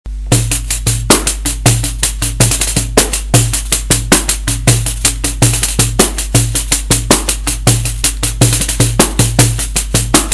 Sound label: Groove, pandeiro